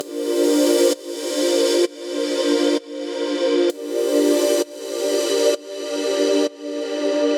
130 G Synth Aqua; Zero-G - Future Wave

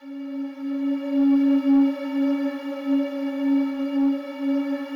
slow strings C#3, Yamaha VP-1; Music Store; 90's Sample CDs

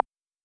Snap, Roland TR-55

